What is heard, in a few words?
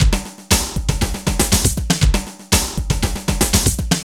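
drum loops, right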